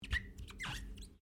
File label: Glass Squeak Rubber